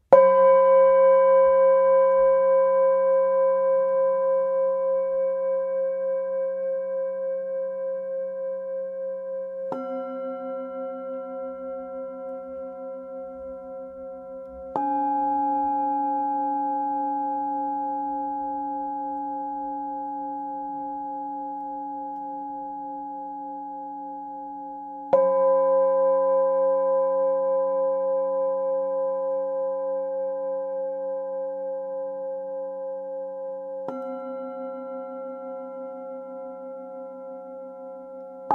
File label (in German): Klangschalen; Traumklang; Rheinklang